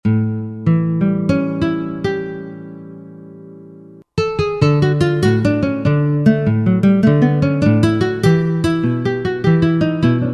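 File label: Requinto Guitar; World; Latin; Roland SR-JV80-18 World Collection Latin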